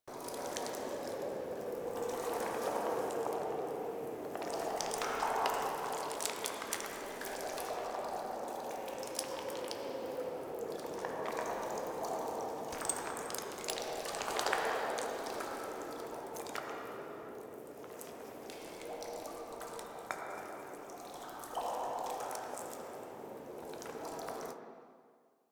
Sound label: Cave Loop A, Ambience; Warframe; Eidolon Plains; Eidolon Landscape; Caves